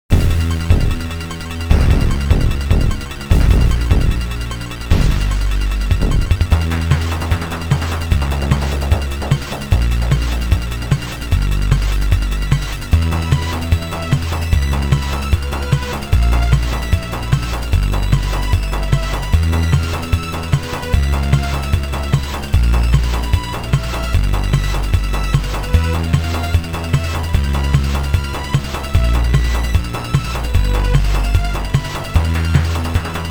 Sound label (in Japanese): METAL エリア; All Sounds of Illusion City